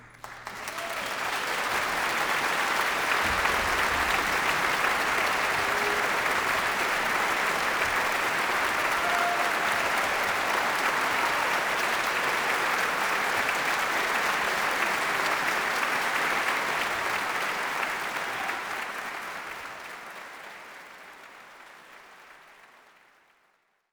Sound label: Applause